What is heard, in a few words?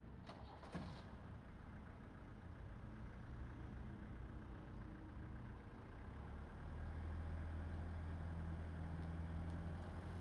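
peugeot p4 exterior slow by stop reverse by front; dpa4021; fvtt sonniss gdc audio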